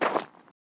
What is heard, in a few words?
Left Gravel Footstep